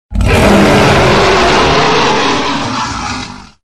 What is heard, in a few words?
rancor, voices male; Ранкор